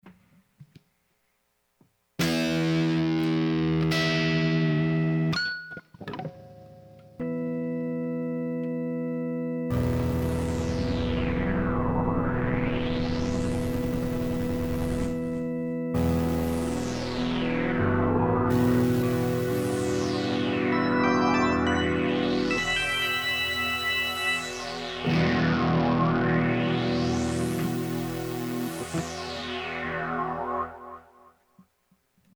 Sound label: Psychedelia Progressive Rock Prelude